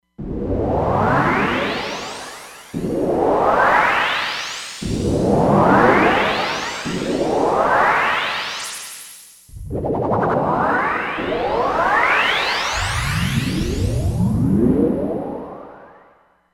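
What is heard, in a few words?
Poly Sweep Noise, Roland Fantom XR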